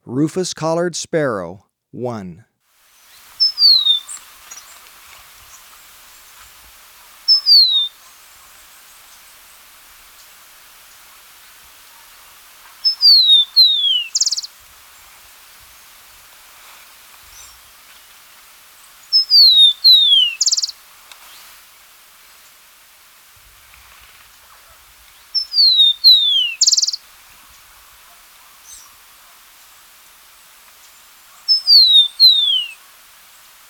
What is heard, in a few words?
Rufous-Collared Sparrow, Birds of Ecuador; S. America